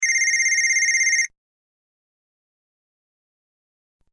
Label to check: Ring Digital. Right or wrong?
Right